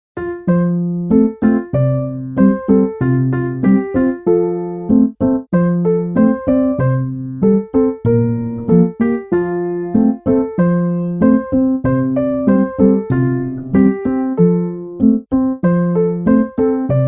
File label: Capsule Toy, あかるい かわいい